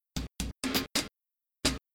Rhythm Breaks Loop HH

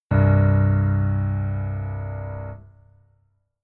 Keyboard Voicing

